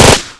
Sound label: uzi fire both; weapons